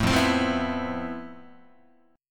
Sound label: F Major Sharp 11th, Guitar; strummed; JGuitar